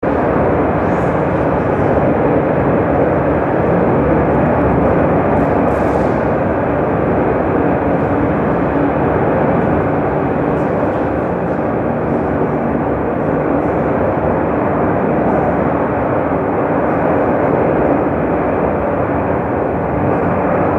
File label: Documenta Fridericianum; documenta 10 1992 kassel kunsthalle